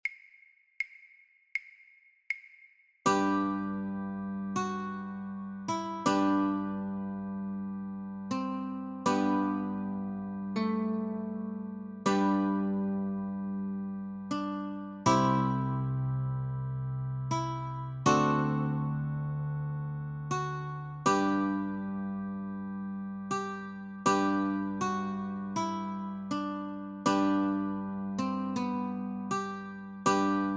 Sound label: Notes & Chords, guitar; Joy to the World; christmas